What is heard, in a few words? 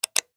Button Switch